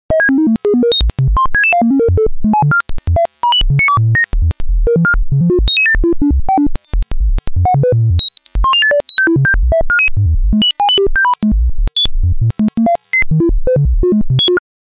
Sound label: pc calculation; 攻撃